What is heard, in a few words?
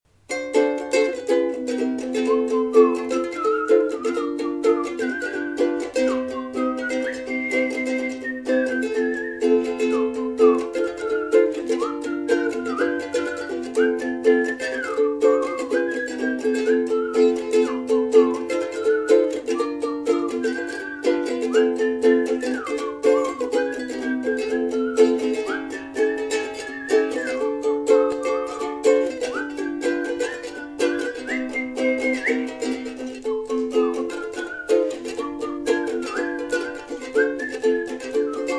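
ukulele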